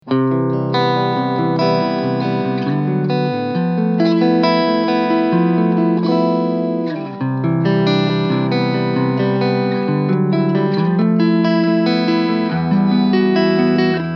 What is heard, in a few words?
Vox Mini Superbeetle Cleansound